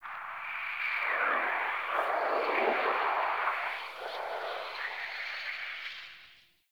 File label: Venus Atmosphere Gust, Ambience